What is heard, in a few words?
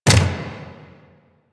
Turret Dry Fire; APC; Warframe; Lasrian